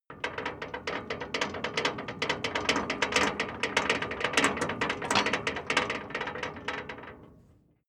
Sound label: metal gate rattle sequence